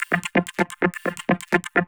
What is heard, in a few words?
Vocoder Loops 128BPM